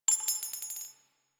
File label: Exalted Sniper Shell Casing; Warframe; Frumentarius